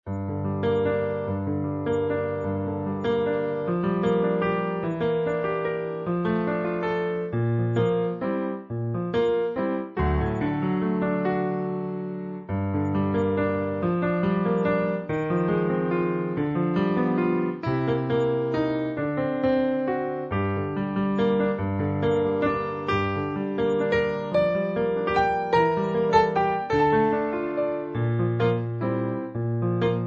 piano cover

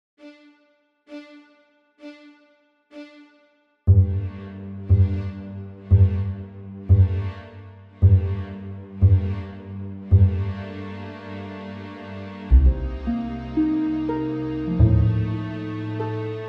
adagio

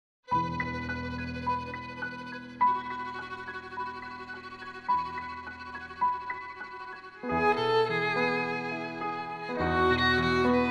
F play full referencia flute

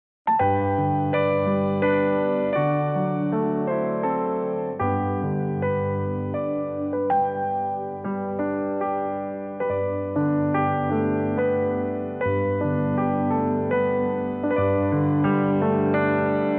G pianopad

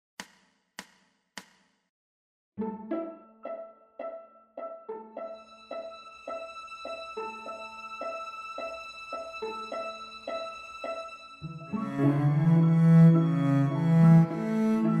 cello

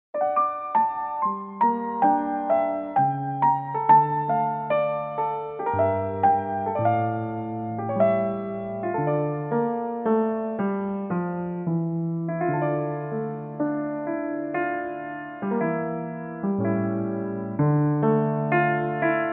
piano play

